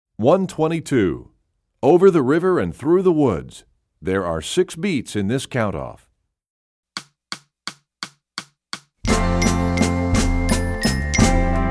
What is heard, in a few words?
Percussion; Over The River; EE2000 Book2